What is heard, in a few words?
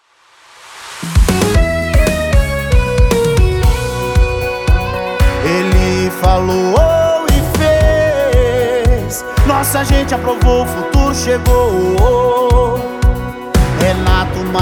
JINGLE; PREFEITO RENATO 2024